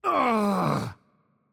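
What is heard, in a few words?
Hurt Heavy, Arabic; bloom minigame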